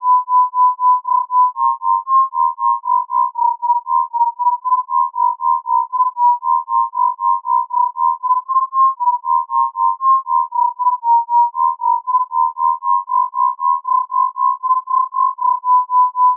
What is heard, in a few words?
throb